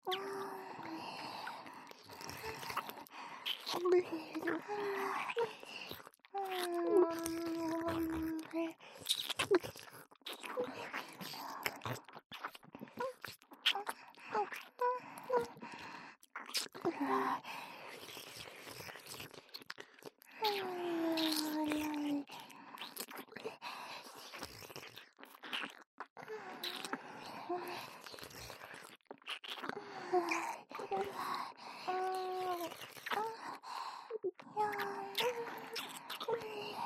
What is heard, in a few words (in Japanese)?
Wディープキス; おまけループ（オナサポ）; キスプレイ